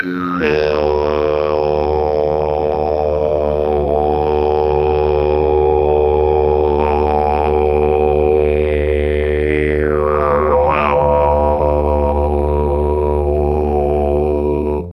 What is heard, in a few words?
didgeridoo long sound; musical instrument